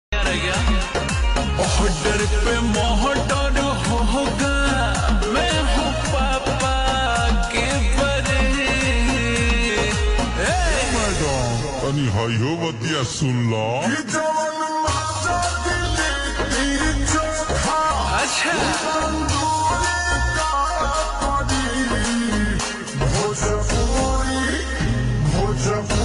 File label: Bhojpuri Ringtone, slowed and reverb